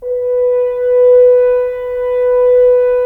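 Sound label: Solo French Horn; Roland - LA Composer vol. 4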